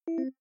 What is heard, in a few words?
neutron drop; build-an-atom